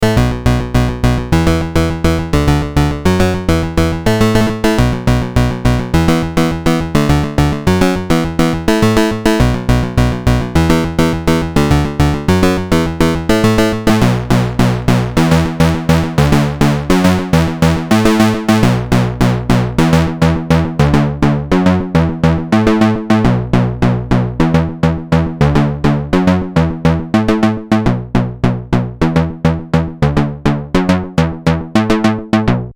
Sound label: MicroBrute Square Seq